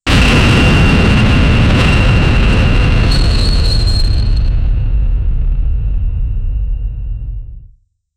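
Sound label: a heavy mortar shell incoming; battlefield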